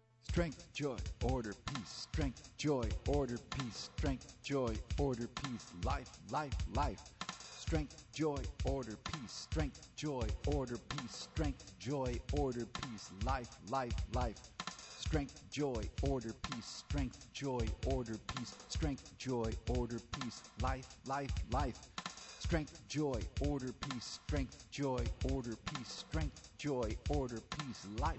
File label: Chant